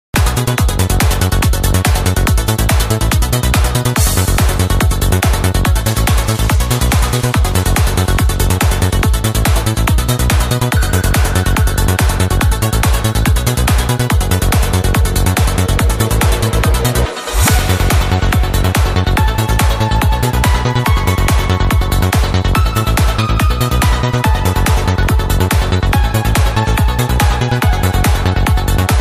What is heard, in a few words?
Psy Trance